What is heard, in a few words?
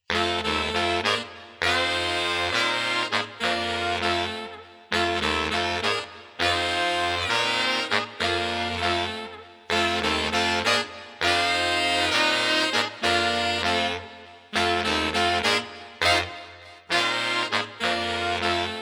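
Street Sax Combo Smooth, 100 bpm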